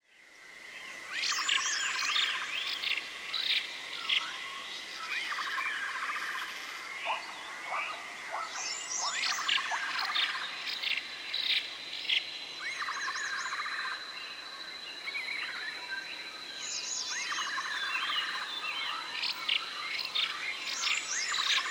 Horror Enchanted Forest Slight Wind Active Birds Insects Ambience; Scary Soundscape